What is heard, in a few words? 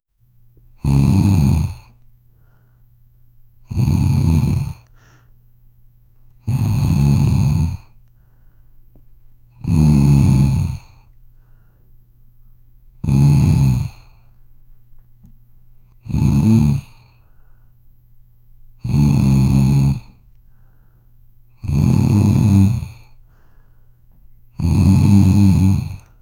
an old lady snoring; bedroom